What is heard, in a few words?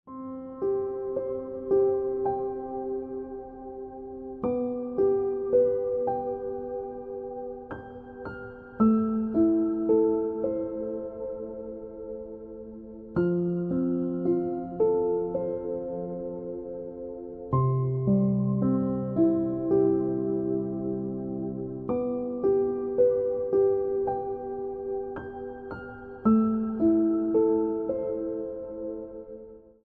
Ringtone Piano Music